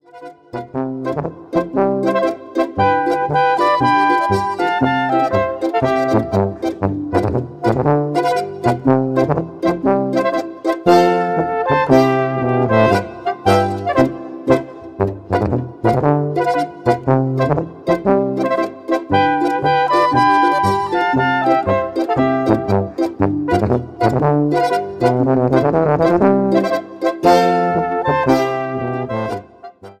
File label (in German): Tanzmusikanten Polka